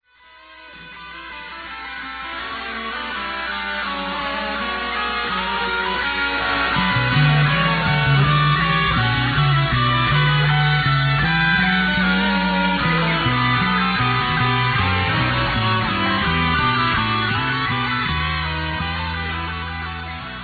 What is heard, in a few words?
fugue